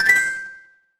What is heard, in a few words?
ui chime